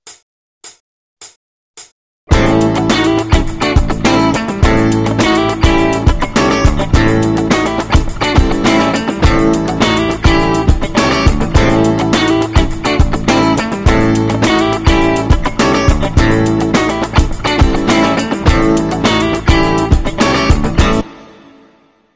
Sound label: funk